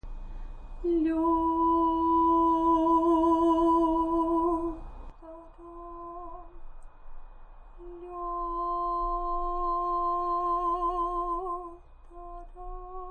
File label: without piano, singing